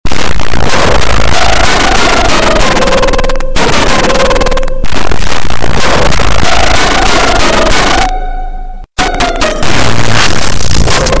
Drip Song Earrape; Among Us

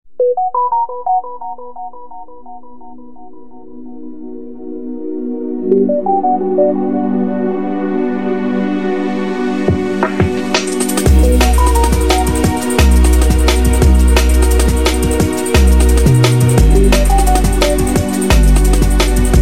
Notification Remix; Samsung

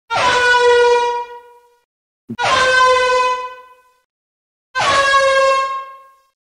Air Horn; Lethal Company

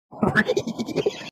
Goofy Ahh Laugh; Quandale

